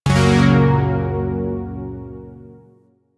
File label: Yo Chord A, Zariman Shawzin; Music; Warframe